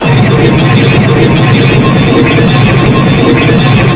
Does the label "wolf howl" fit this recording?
No